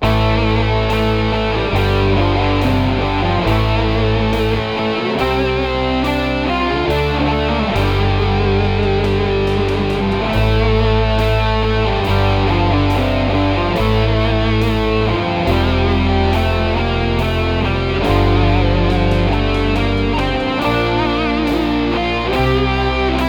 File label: menu music; codeskulptor; riceracer